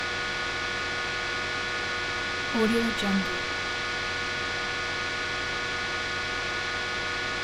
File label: scifi spaceship interior industrial module background loop